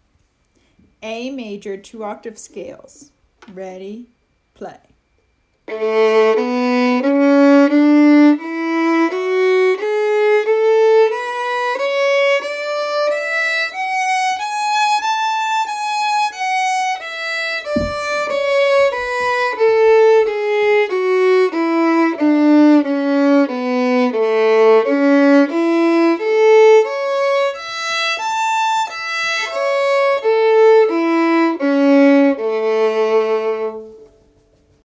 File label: A major 2 octave scale and arpeggio